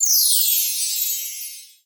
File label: Pickup Magic Bells; SFX Kit; Pickups